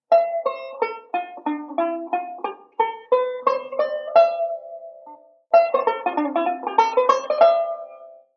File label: Modal Sequence; Beyond Chord Melody Volume II